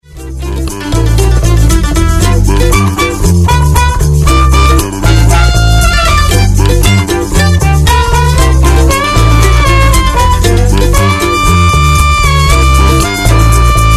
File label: electric harp